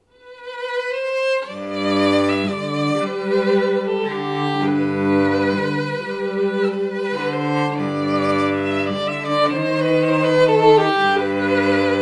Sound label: string duo; Scherezade